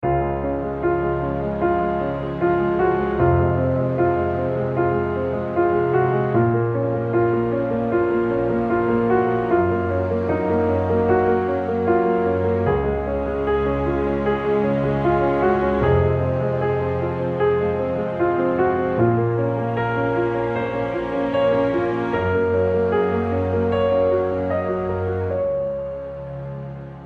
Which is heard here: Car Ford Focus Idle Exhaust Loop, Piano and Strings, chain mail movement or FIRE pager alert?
Piano and Strings